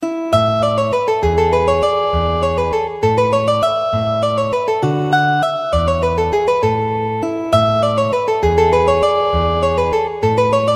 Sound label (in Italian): valses, guitar